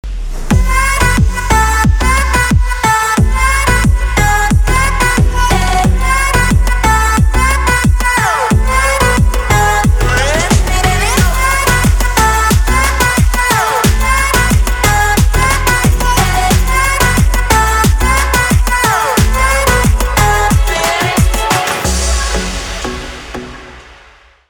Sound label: instrumental mix